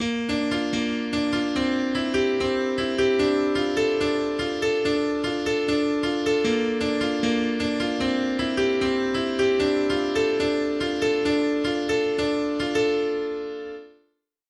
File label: Piano play